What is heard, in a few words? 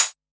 Analog SY1 Hat